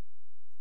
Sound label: C#, Synclav FM, FX; Big Fish Audio